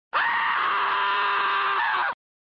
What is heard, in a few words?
(blood curdling) scream, flipper; Lossing choose wisely